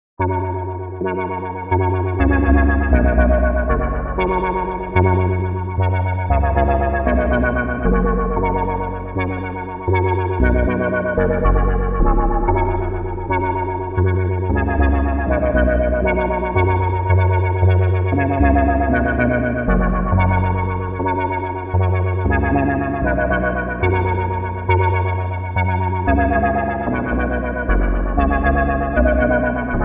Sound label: Piano Temple; spaceship